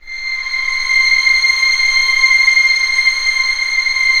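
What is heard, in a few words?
strings C6 PP L; Sound On Sound - Peter Siedleczek's Orchestra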